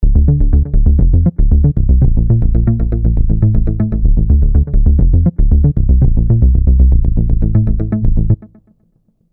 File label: Dreamer Arps